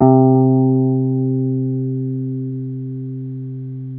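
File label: clean C, gtr, guitar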